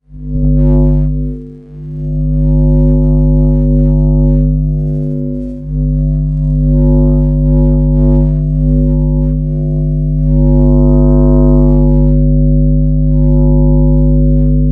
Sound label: electro magnetic fields hums bass; fvtt sonniss gdc audio